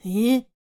Blowdarter Attack Vocals

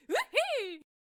Hay Baler Attack Vocals

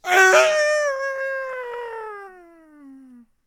Death Vocals; Tempest Lich

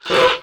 Raptor Attack Vocals

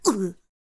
Blowdarter Death Vocals

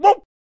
Potionseller Attack Vocals

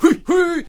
Hwacha Attack Vocals

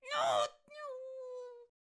Fan Bearer Death Vocals

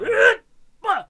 Shield Bearer Attack Vocals

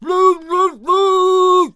Blunderbuss Attack Vocals

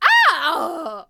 Pirate Queen Death Vocals

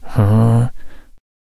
Deadeye Attack Vocals

